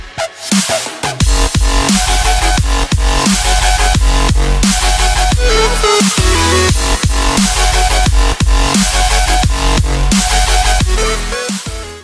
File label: electro; roundsound